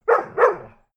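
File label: bark twice, dogs; natur-tiere; hunde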